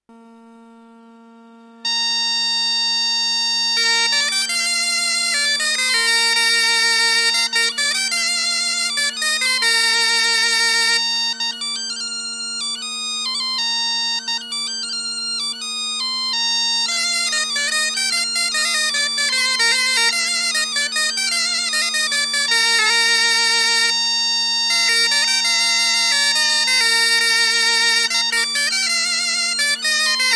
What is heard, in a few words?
Gavotte Bal